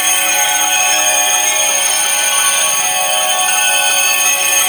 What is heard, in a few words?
Veil Struggle Loop; Stolen Plates